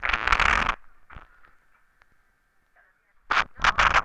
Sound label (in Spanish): toma mate; Oído Absoluto